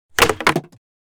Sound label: Plastic item drop onto hard surface; impacts; gfxsounds